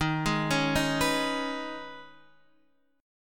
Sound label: Eb 7th Suspended 4th Sharp 5th, Guitar, DADGAD